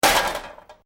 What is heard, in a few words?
Metal Debris Impact Short Medium; Small metal junk crash. Tools, pots & pans or metal parts small impact with rat